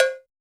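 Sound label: LINN COWBELL; Drums In Your FACE!; NEWJACK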